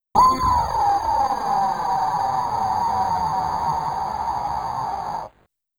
Credit Sound

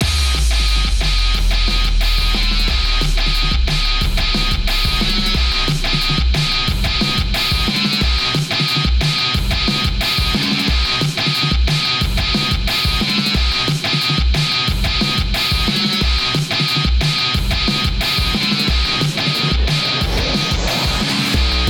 Instrumental

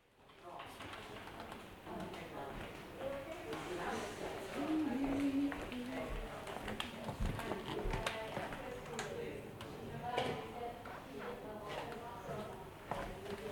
environment hospital

